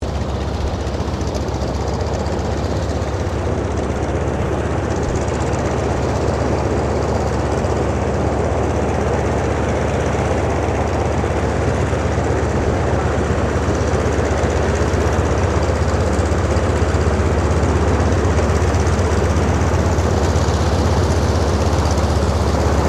AIRCRAFT CAC WIRRAWAY IDLE; CA-16 Wirraway; Airplanes; Vehicles

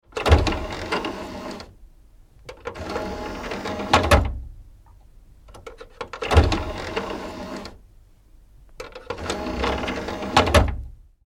CD or DVD Load eject; Electronics; Tom Hutchings

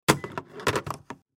Telephone Set Down; Beep; Technology; Electronics; Blastwave

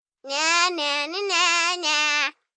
Taunt Nyanya, Attitude, 1 child, 8 yrs; VoiceBox

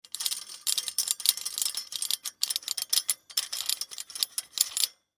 Horror Torture Device Chain Pull; Miscellaneous Combat; Blastwave

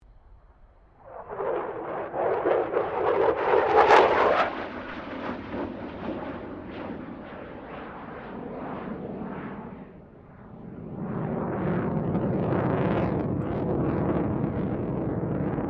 AIRCRAFT F16 FALCON MANEAUVERING; Airplanes (Sound Effects:Vehicles)

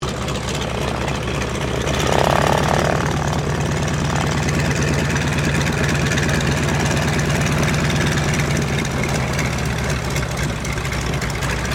AIRCRAFT STINSON RELIANT SR-8C SHUTDOWN; 1935; Airplanes; Vehicles

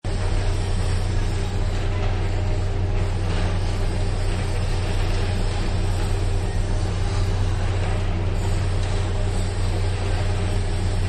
Quarry Workshop, Construction; Backgrounds

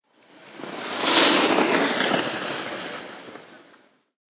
Skier Ski By, Skiier skiis by; Skiing and Snowboarding (Sound Effects:Sports)

